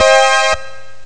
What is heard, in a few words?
power min; ST-XX Amiga Soundtracker Sample Packs